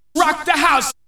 VOX SHORTS; Megabass Remix! 2

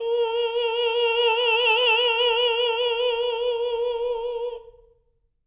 TENOR A#4; Spectrasonics; Symphony of Voices

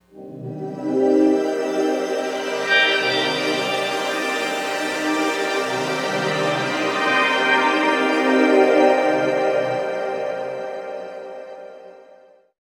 Sparkle Minor, pad; Sonic Foundry (Sony Creative Software); James Johnson Spektral Minimalism; Pad FX

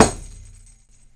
DOIRA, ETHNIC PERC; InVision Interactive; Lightware VOL-1

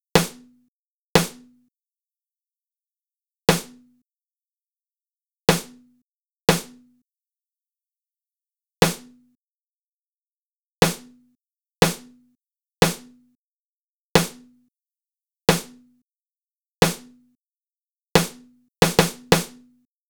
Snare, Snare Patterns; Beat Components

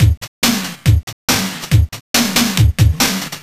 LoFi Beats, 140 BPM

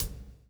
PULL, Backbeat; Spectrasonics - Backbeat

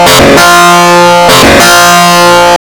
DISTLOOP, Big Beat Samples; DISTLOOP KIT; AKAI

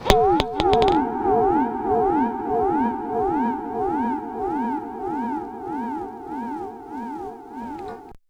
SPACEY L, Funk Guitar; Zero G - Funk Guitar

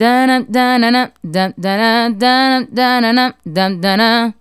Female Solo, Vocal

